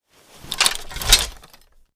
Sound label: axe draw; weapons